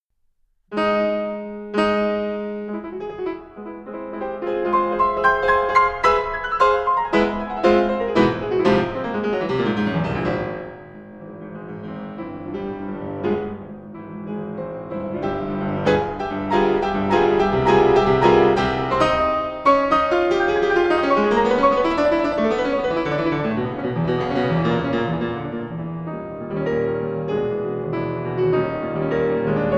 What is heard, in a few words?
piano; fortepian